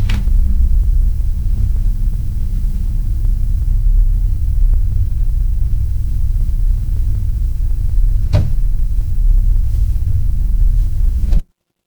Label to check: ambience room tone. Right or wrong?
Right